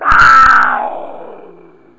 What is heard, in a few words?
death; quake2; madpear